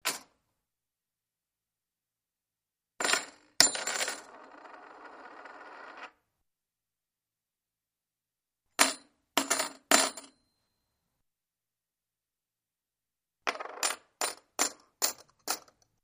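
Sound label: Coins Drop Coin Movement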